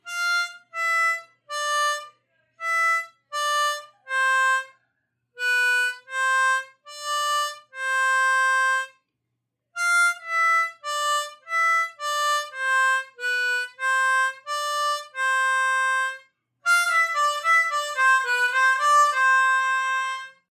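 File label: C Major Triplets Descending, harmonica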